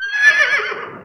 Animal, Yamaha DD-20

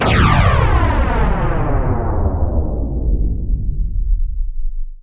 BALLISTIC, WARHEADS; Spectrasonic Distorted Reality 1

